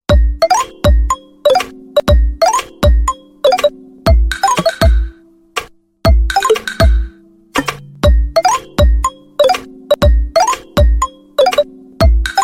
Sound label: lumia1020 ringtone; brikabrak